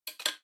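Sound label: putting a jar lid on the table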